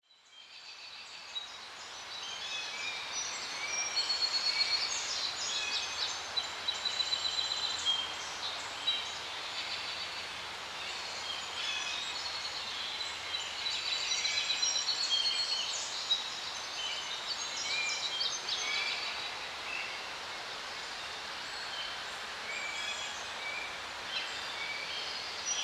forest birds; ambient; minecraft; Remastered

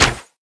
bow loose; items; Minecraft; Remastered

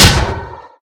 hit, iron golem; mob; Minecraft

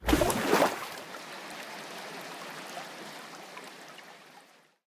exit, underwater

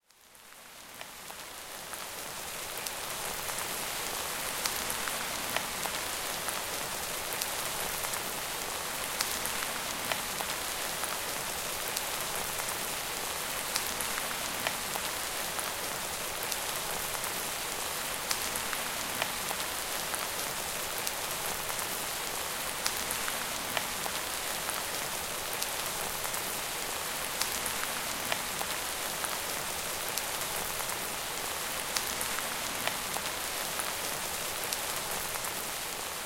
rain, weather; ambient

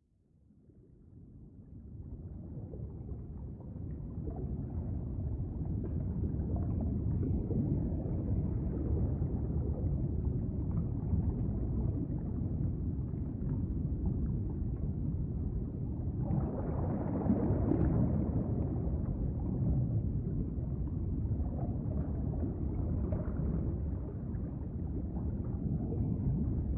deep, ambient underwater

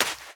sand run, step; Minecraft; Remastered

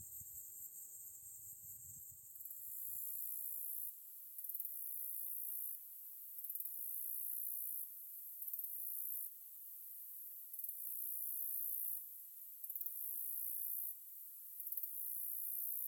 cricket

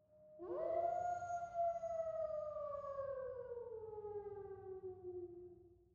wolf

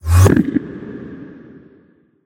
portal, endermen; mob; Minecraft

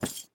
crystal walk; blocks; step

